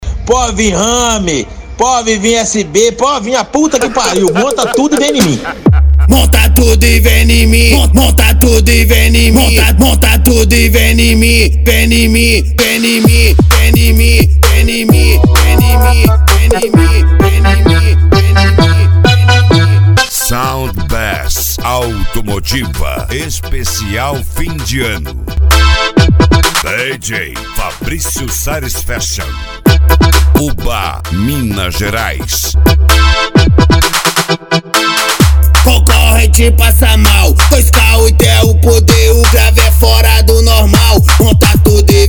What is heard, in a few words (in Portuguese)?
sound bass automotiva; uba mg